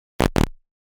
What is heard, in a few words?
error